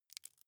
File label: wood chip small break creak soft